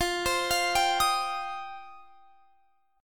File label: F 7th Suspended 2nd F down; F7sus2; arpeggiated